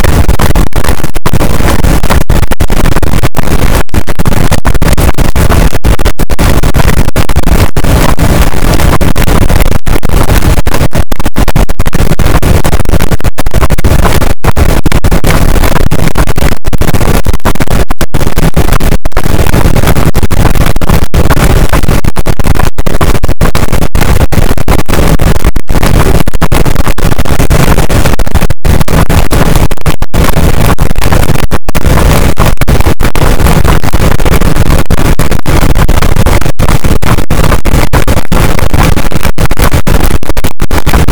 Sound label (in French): cachalot positif, boat; Physeter Macrocephalus